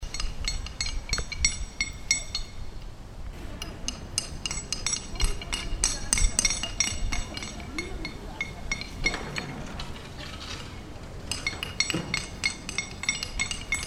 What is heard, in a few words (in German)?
Pflasterer